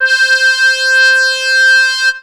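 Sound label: accordion; synths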